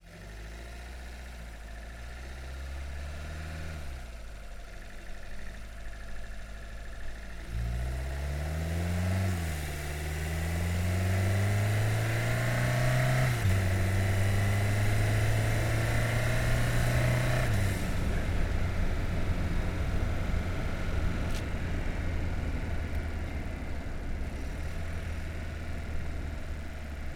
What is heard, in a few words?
VW Golf - ONBRD - FAST - Drive Various - ENGINE - Center; Pole Position Production; Volkswagen Golf R-Line 2018; PZM